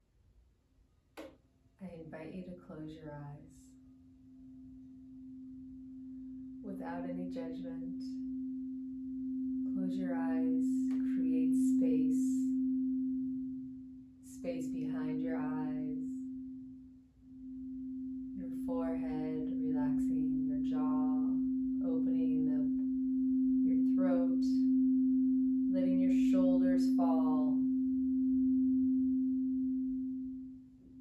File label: Seven Minute Sound Bath